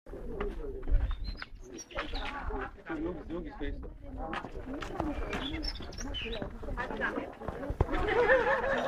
bird singing

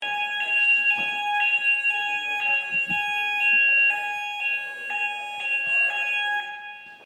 fire alarm test; parliament buildings

